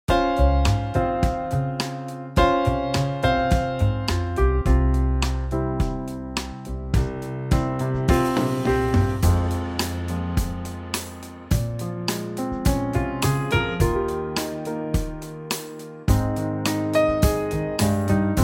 trio Fm

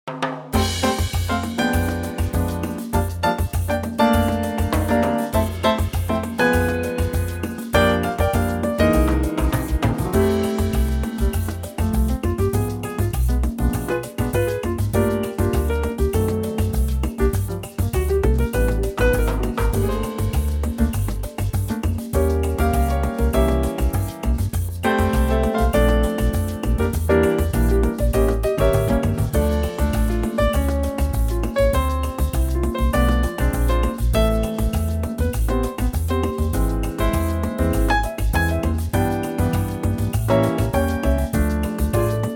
F latin

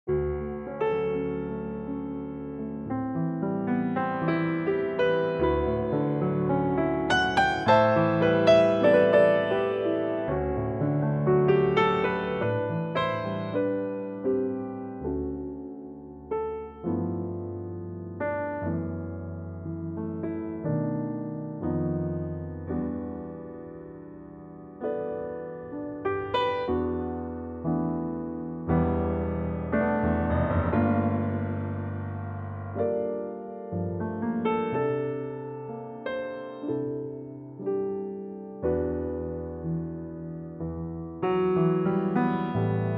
piano

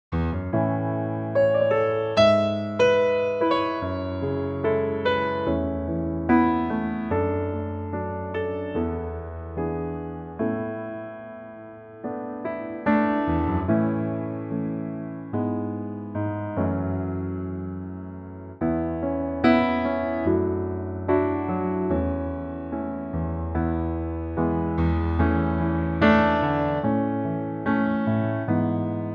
A piano

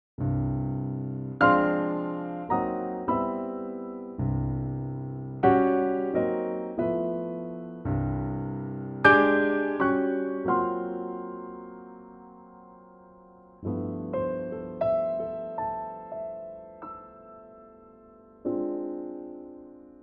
C piano

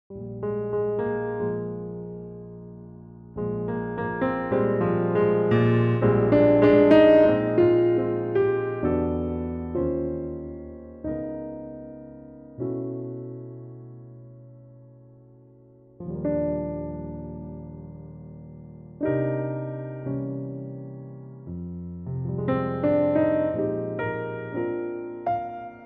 Eb piano trak

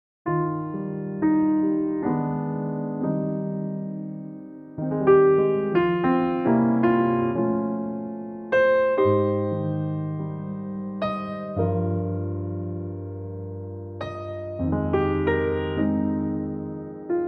Eb piano

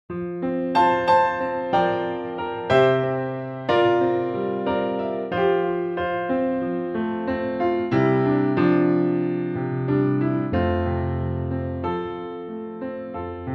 piano F

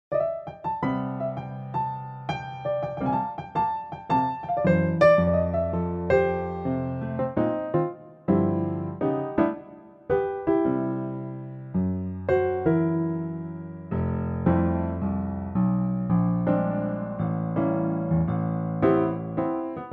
C piano

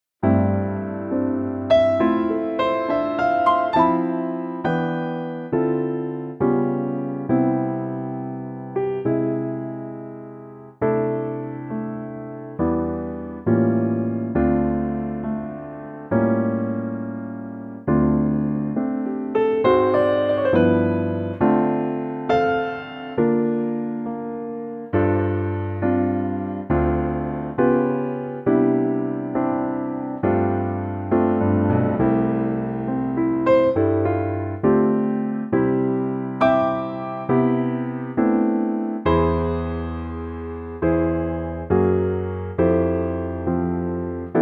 C piano

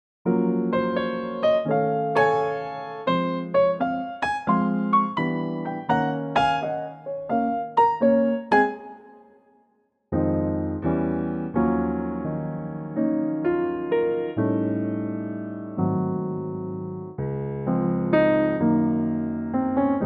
Db piano

